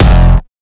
kick oneshot lilmonster F; GhostHack

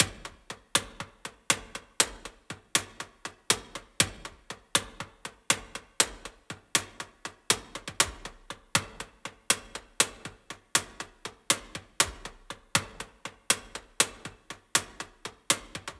Metal Shaker - 120BPM; Percussion; Ghosthack; SkyRealm; Percussion Kits; Kit 19 - Dragon Sword

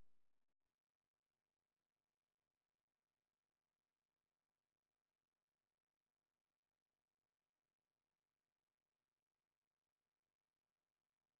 92 BPM D Min Texture; Melody; Loop Stems; Cymatics; 2022 Melody Collection Platinum Expansion - Pt 2; Top Floor

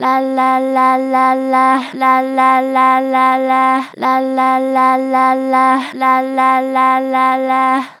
Str Vib Lala, Vocals, Natural Vibrato Strong; Harmor Vocals

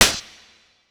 Snare, Dubstep Snares; GhostHack

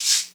Studio Shaker; Cymatics; PHARAOH Beta Pack; Pharaoh Premium Drum Samples (BETA)

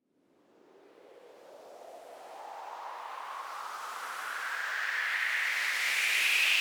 SWEEP UP

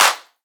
Clap

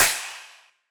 Snare, Dubstep Snares

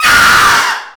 horror; Cartoon - film effects

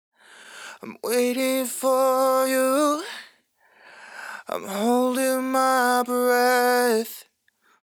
Sung Phrase Dry, 128 BPM A Min, vocal; Waiting; Cymatics; Euphoria Vocal Sample Pack

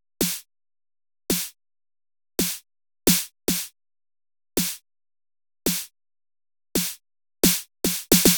Snare Loop - 110Bpm, House; Ghosthack Advent Calendar; House Kit; House Construction Kit

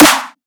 Riddim Snare; One-Shots; Ghosthack; Riddim Essentials Volume 2

